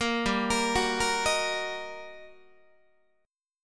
G Minor Major 7th Sharp 5th, G, down, Guitar, 4A 4E 5A 5D 5G sharp 6C sharp; GmM7#5 arpeggiated; JGuitar